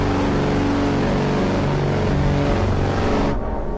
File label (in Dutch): boot toeter